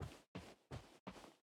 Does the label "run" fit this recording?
Yes